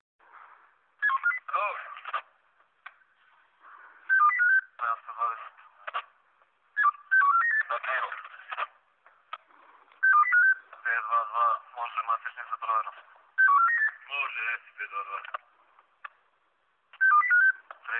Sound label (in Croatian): Policija